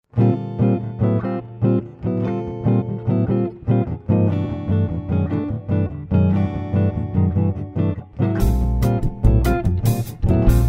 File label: Samba